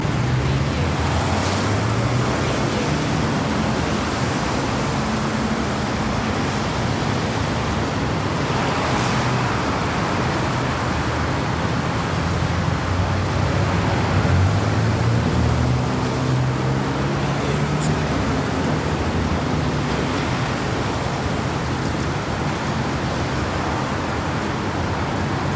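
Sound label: ambience city traffic Tokyo Japan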